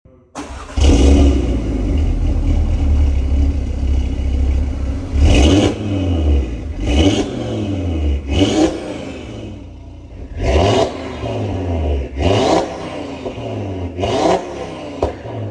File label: Revs